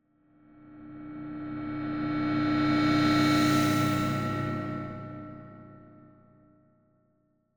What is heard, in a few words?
Cymbal Reversed Metallic High Pitched Ramp In Tension Pad - Metallic Tone; Hollywood Edge; sound designer's toolkit v2